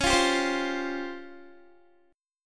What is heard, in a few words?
Db Major 7th Flat 5th Db down Guitar; strummed